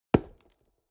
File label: lumber tree